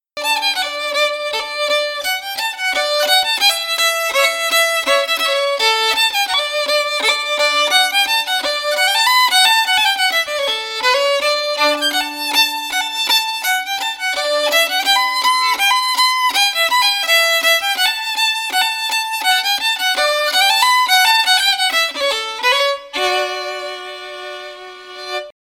orange and blue fiddle; tune tutor; SeamusGrantFestival

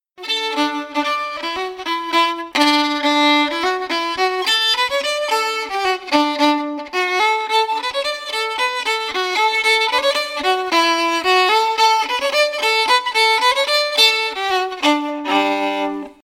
polka fiddle; seamus grants polka; tune tutor; SeamusGrantFestival

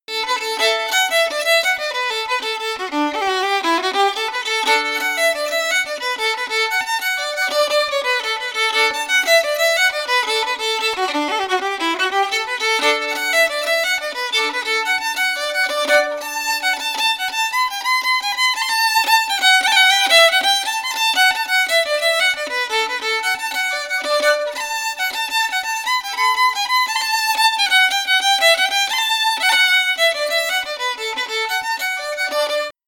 fiddle; haste to the wedding; tune tutor; SeamusGrantFestival

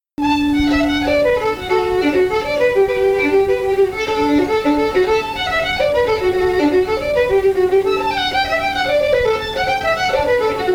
reel fiddle; skylark reel; tune tutor; SeamusGrantFestival

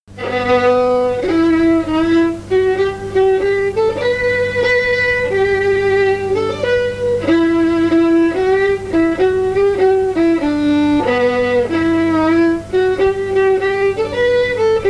king of the fairies fiddle; tune tutor; SeamusGrantFestival

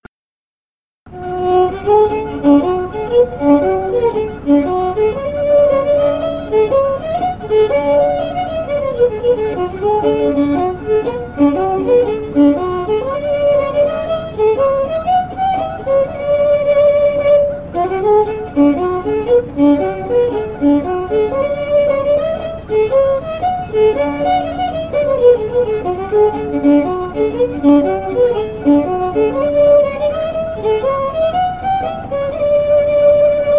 hornpipe fiddle; black swan hornpipe; tune tutor; SeamusGrantFestival

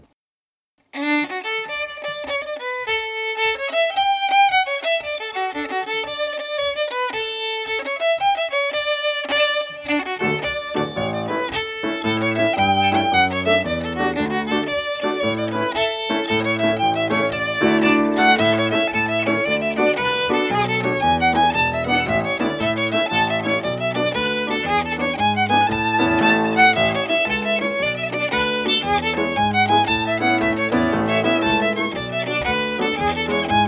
jig fiddle; east coast jig; tune tutor; SeamusGrantFestival

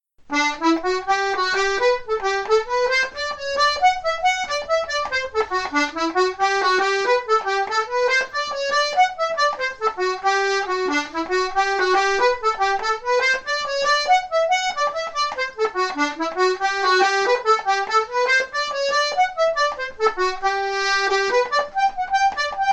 killimor jig accordion; tune tutor

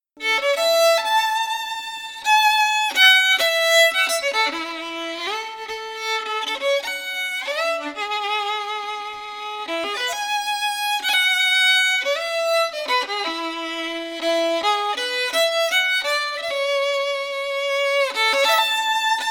waltz fiddle; dolphin waltz; tune tutor; SeamusGrantFestival

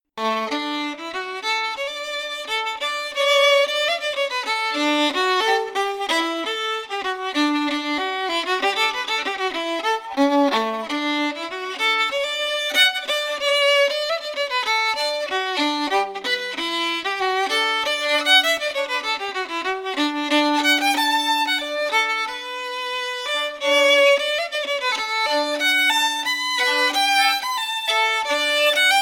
march fiddle; da guisers march; tune tutor; SeamusGrantFestival

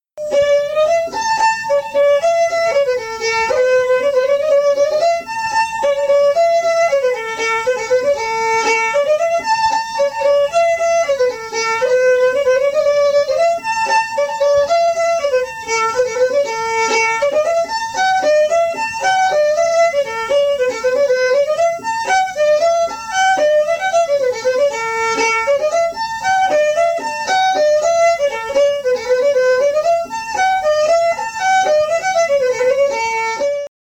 eight hand reel tune two fiddle; tune tutor; SeamusGrantFestival

